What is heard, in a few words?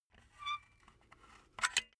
silicone gun empty pump slow; soundpool